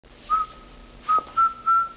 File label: keychain